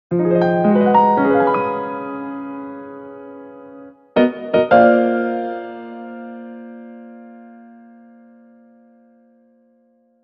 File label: Treasure Chest Jingle Regular